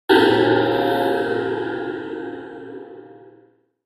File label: Impact Reverbed Meta